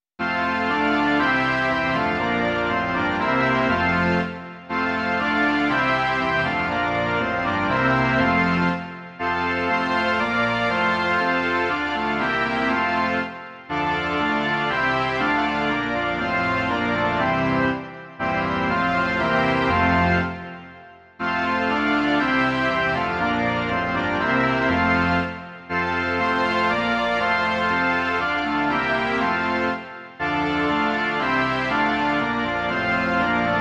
varhany a smyčcový orchestr; Vstal z mrtvých Kristus Spasitel; Imaginativní verze

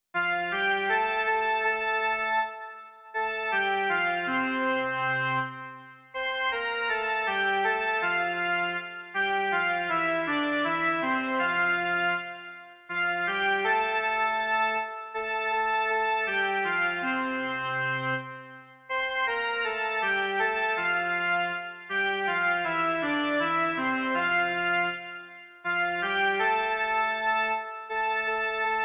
Blahoslavenství v době velikonoční a svatodušní - jen melodie; Mužská verze; Doprovody Farského liturgie

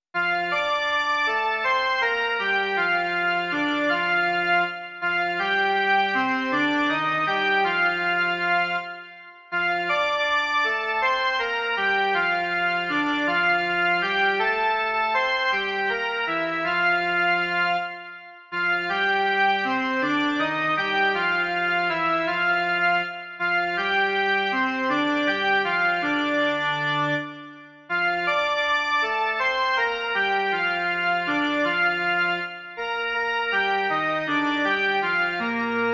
jen melodie; Já slyšel Ježíše

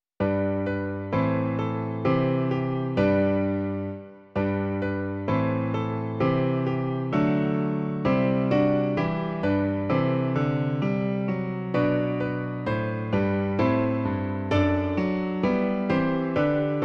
klavír; Klavírní verze

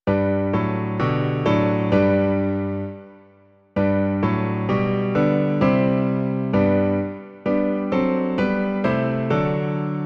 klavír; Kdo jsou bratří moji; Klavírní verze

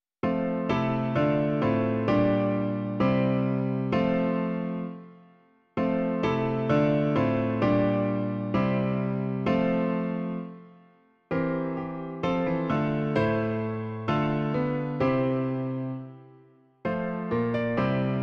klavír; Klavírní verze

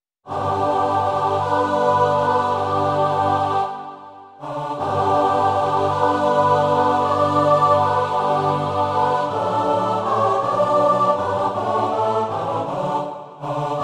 pěvecký sbor; Narodil se nám Spasitel; Imaginativní verze

